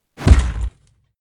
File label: Whump